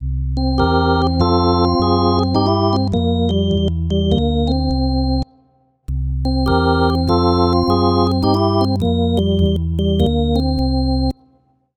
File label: VB3 Phrase